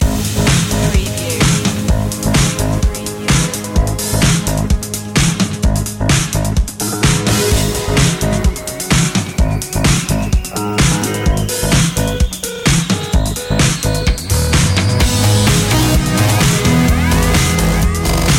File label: robo-disco